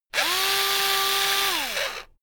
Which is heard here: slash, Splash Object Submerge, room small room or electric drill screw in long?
electric drill screw in long